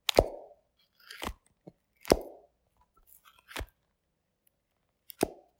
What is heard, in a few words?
Opening Closing Jewelry Box